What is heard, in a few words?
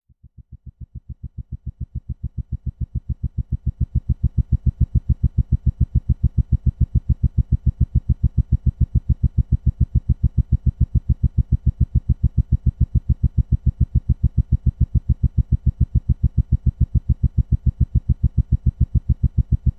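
Heli Blade Whops Slow, helicopter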